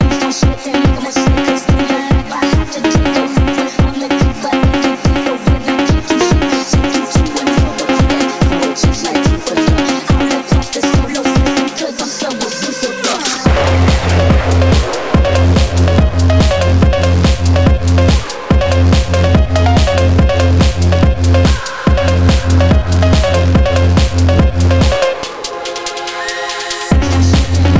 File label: Phonk